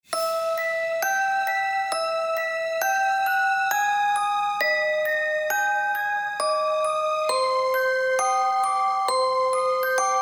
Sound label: halloween background music